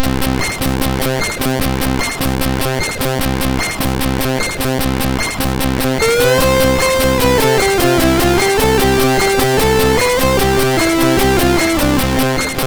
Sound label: Train Music sid MOS6581R3